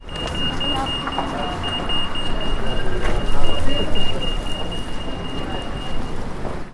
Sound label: pedestrian crossing